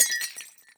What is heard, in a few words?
glass vase break